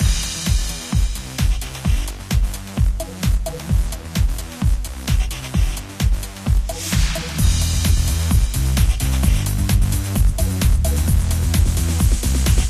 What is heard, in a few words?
Energetic Music; Android